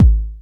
00s short bass drum one shot g sharp key, Royality free kick drum sound tuned to the G# note